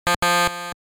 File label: Incorrect Password; Teenage Mutant Ninja Turtles 3 Radical Rescue; Gameboy